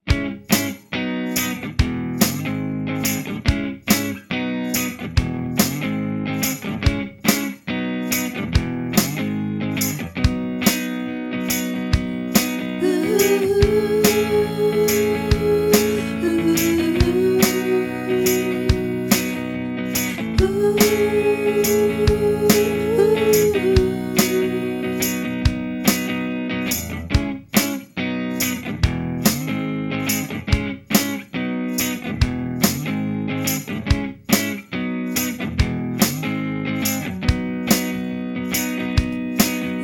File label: Kick Snare